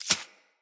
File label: Arachnoid Micro Step Snow; Corpus